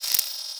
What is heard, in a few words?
glitch; pad